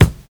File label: rich top end kick sound a sharp key, Royality free kick tuned to the A# note; kick drum samples